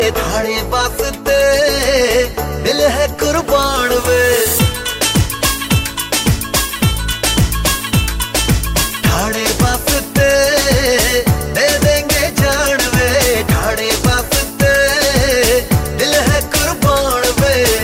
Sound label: Desi Beats